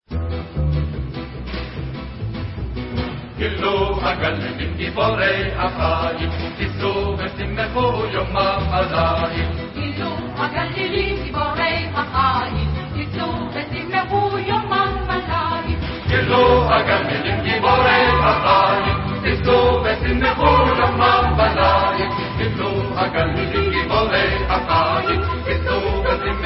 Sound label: Choir